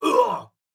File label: flinch med; Rust